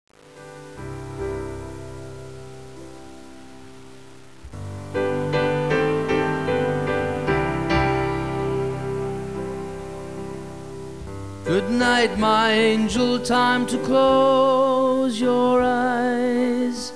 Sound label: lullabye